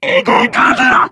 charger lurk, voice idle; player; source engine; left 4 dead 2

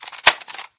m249 box in, weapons; Source Engine; cstrike

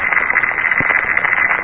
deadsignal, ambience; Source Engine; hl1

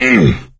hurt minor; voice; source engine; left 4 dead 2; survivor

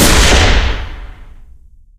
sniper; bazaar bargain; tf

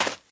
gravel, footsteps run

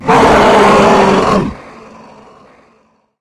yeti roar second; player; taunt; video games; source engine; tf

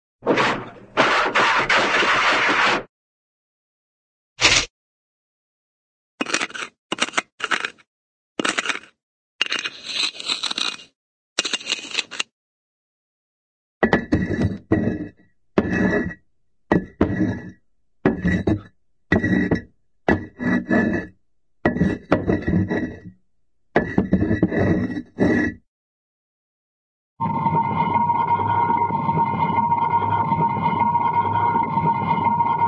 scrape, metal hard metal scrape; cartoon

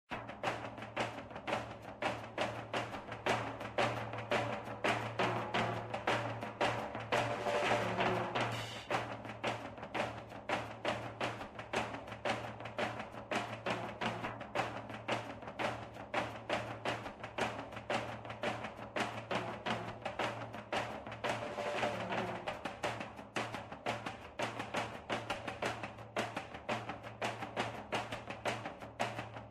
music; drunken pipebomb; source engine; tf

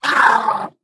ballbot pain hard surface

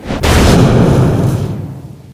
dog drop gate, npc; Source Engine; video game

